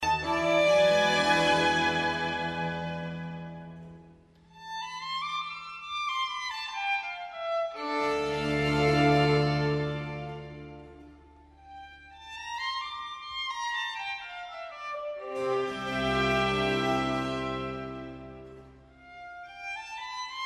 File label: Concerto for violin in A minor; No 5; Antonio Vivaldi